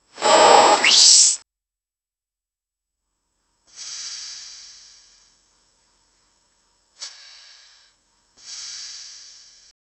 whipped cream slide slow

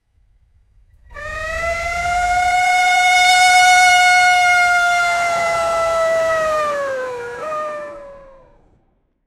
porsche 911 rsr, sports car

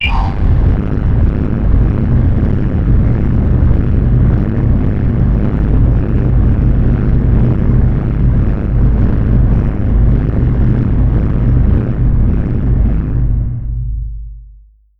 sound of an engine of; alien ship

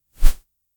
the sound of a blanket, casual game

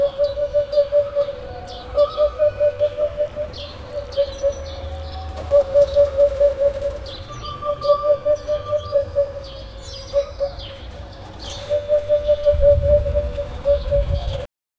a medieval ambient loop, animals

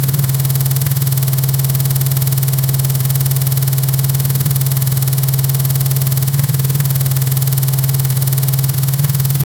fpv racing drone idle mid

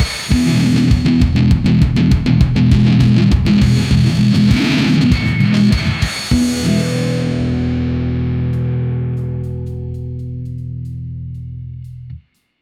radio jingle, aggressive

